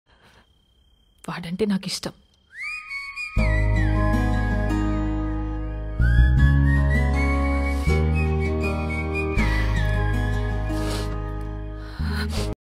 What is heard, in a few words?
romantic ringtone instrumental bgm whistle bgm